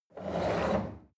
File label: chair wheel roll on floor medium squeak